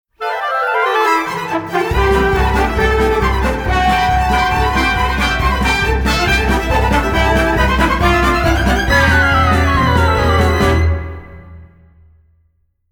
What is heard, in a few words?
Apple Throwing